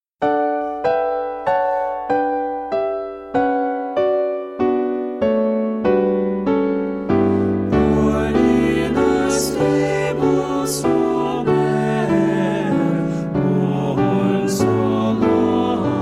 Nativity Carol, Choirs

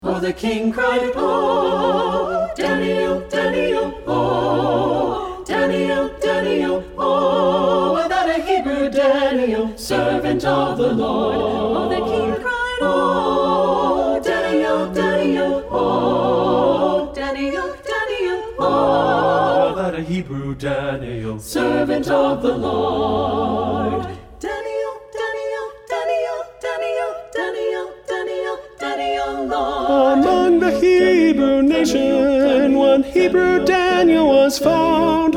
SATB

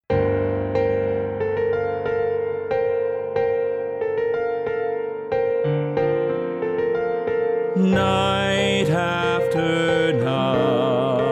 TTBB

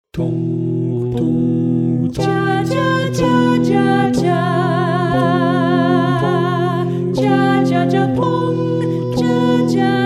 SATB

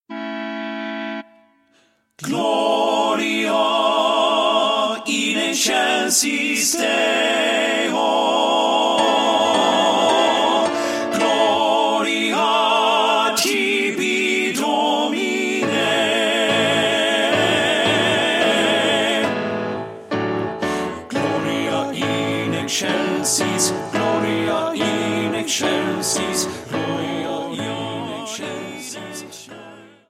Gloria Fanfare

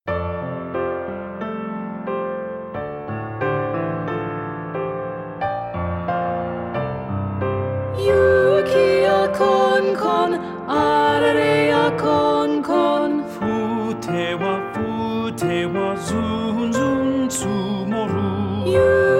Japanese Snow Song

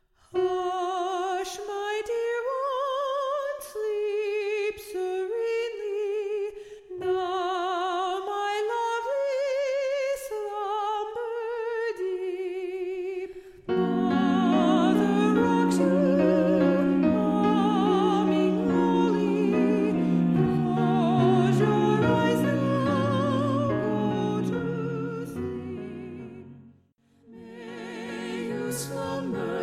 A Welsh Lullaby

